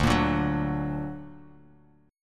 D Augmented D down Bass Standard

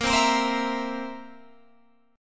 Bb Suspended 2nd Flat 5th, Guitar, Open G

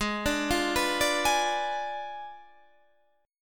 Ab Diminished 7th Ab Guitar Standard; Abdim7 arpeggiated; JGuitar

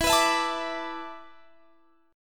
A Suspended 2nd Suspended 4th E

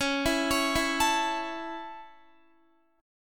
Db Minor Sharp 5th Db Guitar; arpeggiated

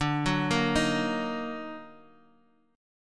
Eb Minor Major 7th D

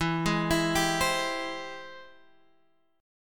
Ab Augmented Major 7th E, guitar

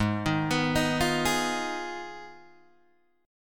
G sharp Minor 6th G sharp down Guitar Open G

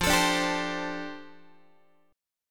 F 6th Add 9th F down Guitar, chord

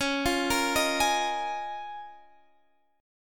C sharp 6th Add 9th C sharp down Guitar Standard; C#6add9; arpeggiated; capo=2

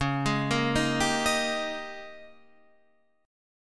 G sharp Major 7th Suspended 2nd Suspended 4th

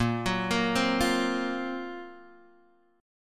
Gb Major Sharp 11th Bb

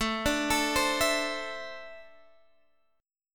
A Suspended 4th Flat 3rd, guitar chord; A4-3 arpeggiated

